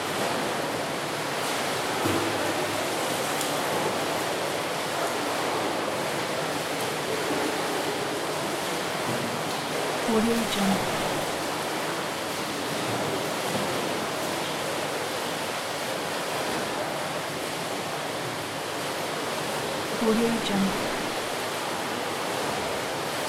ambiance swimming pool indoor loop

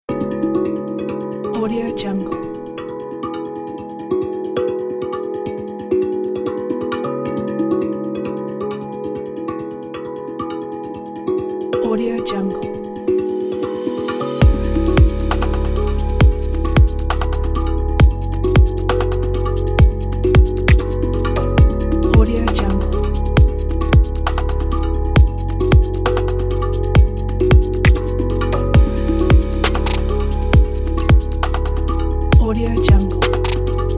ambient background news motivational technology

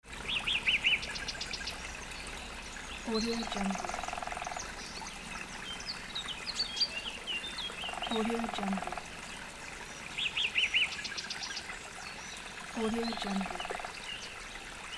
spring forest

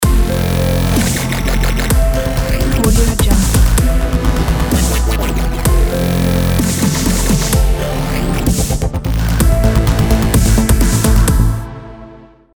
dubstep logo

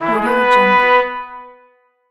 trumpet announcement

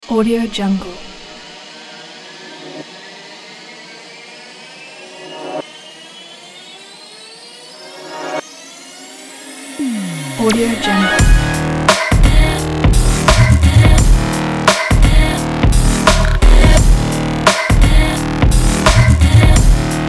dirt fashion electro sport big beat

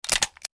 swish click

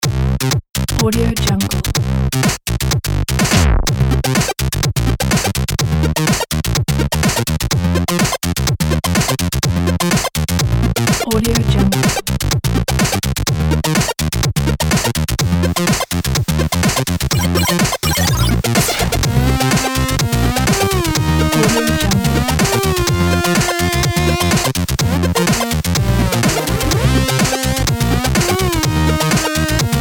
8 bit funky game; ocean studio

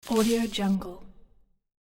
leaves movement short brush; hollywood edge

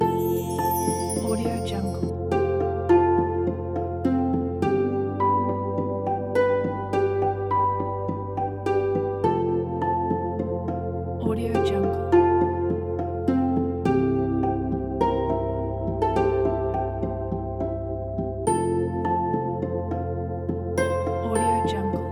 christmas slow ambient loop

